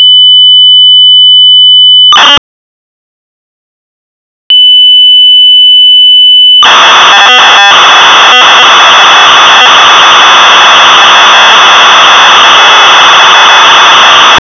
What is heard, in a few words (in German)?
JACKPOT